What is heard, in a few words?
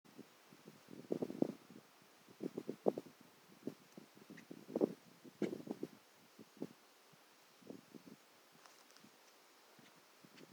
Yellow-headed Blackbird, Xanthocephalus xanthocephalus; Rare Species; Vermont